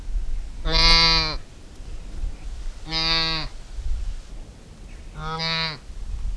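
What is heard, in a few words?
Saiga tatarica juvenile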